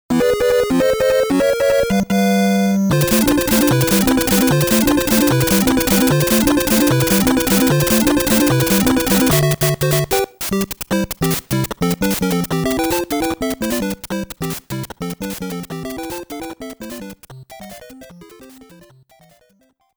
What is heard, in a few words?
Warning ~ Bonus Level - Invincible BGM (Hurry Up!); Game Sound Museum Famicom; Super Mario Bros